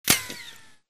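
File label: bullet light ballistic titan ricochet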